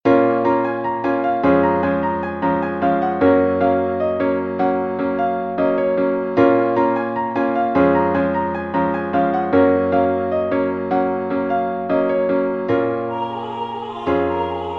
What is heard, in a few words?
MIDI SATB